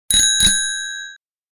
chirinri

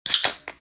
kan open

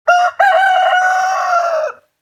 kokekoko

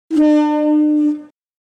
karui kei

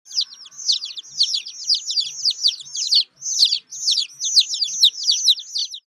hiyoko pi

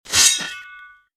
sword shu

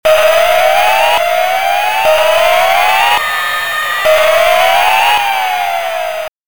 keihou

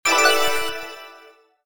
tsuri hit

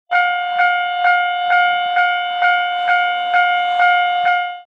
fumikiri

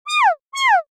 kawaii

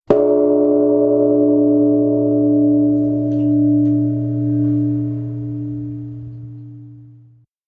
kane tera

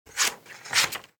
hasami on

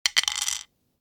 saikoro

